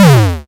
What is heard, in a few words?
kick